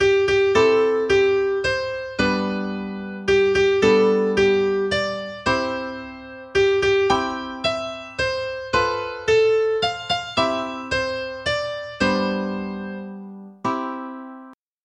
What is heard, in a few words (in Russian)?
s dnem rozhdeniya melodiya, polifonia midi